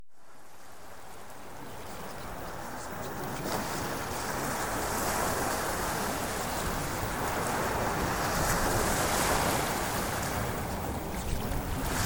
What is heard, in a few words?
Ocean Waves